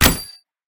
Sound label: snap; garrysmod